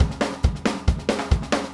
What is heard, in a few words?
137 Zydeco Swamp Drums, Very Fast Tempo; Pop Rock; Pro Tools Essential Loops; Digidesign